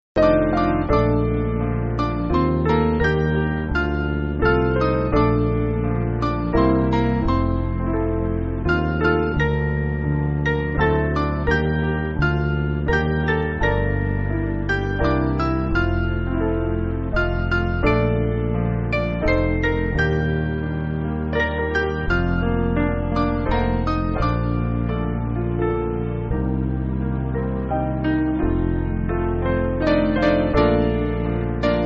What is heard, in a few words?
Piano, Backing Files for Churches; Mainly Piano (CM)